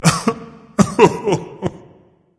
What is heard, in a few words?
boohoo berserker; cuchulainn